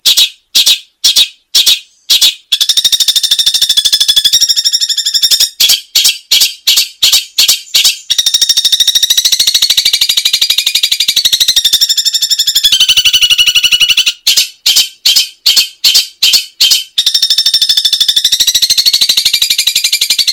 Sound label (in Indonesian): suara cendet isian mewah